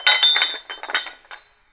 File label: chains; The Monster Plane; warlock golem